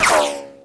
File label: ricochet